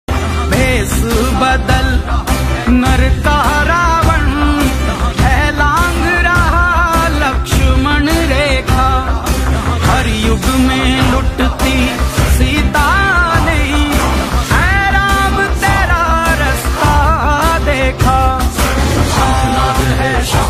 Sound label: shankhnaad ringtone; Diwali special